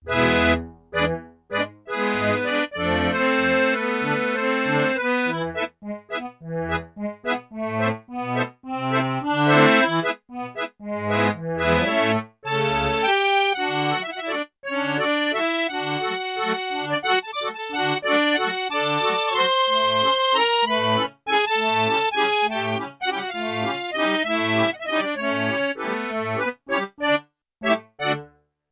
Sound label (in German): Akkordeon, Yamaha TX16W; Combo Performance 2